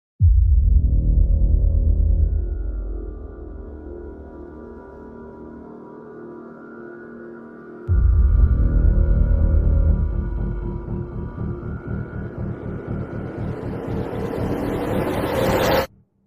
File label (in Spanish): suspenso